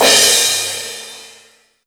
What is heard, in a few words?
CYM CRASH; USB Soundscan vol.27; Ragga & Dub Reggae; AKAI; CYMBALS K